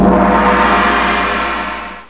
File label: End Countdown; freedroid